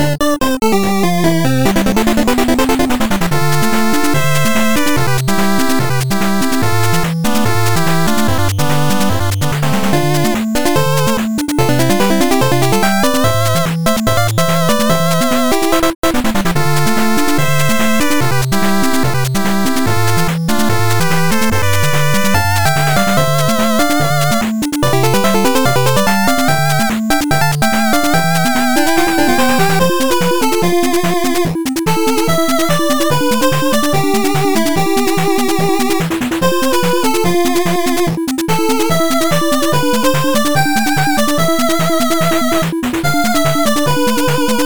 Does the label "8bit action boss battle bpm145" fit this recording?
Yes